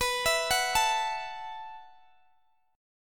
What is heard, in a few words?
B 7th Suspended 4th B down Guitar Open D